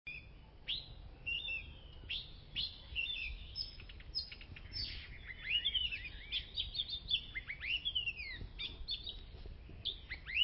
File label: Magpie-Robin